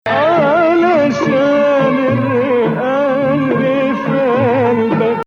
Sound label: Nawa Athar Gameel Saba